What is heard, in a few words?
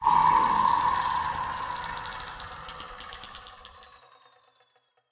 wheel Spin Stop; turn your fortune